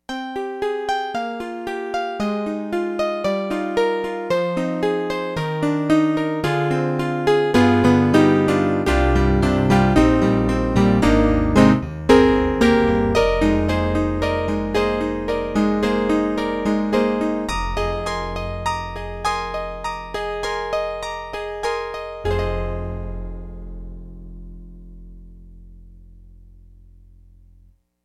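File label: Roland D-110 Acoustic Piano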